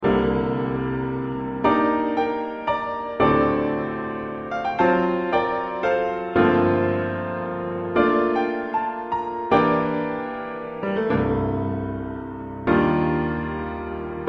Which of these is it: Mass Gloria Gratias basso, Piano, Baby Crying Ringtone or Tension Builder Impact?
Piano